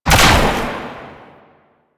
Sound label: Ranged Weapon Fire; Warframe; Necramech